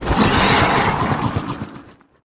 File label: skill bullet